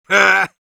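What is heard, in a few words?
laugh short, Russian; Medic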